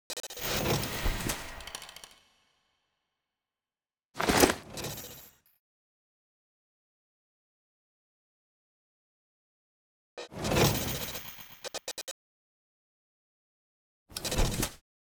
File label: SFX; BornAgainVirgin